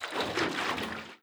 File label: contents full, metal barrel; physics; props